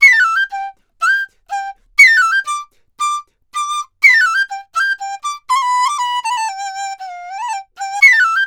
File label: DIZU 120-C; INIS; Opium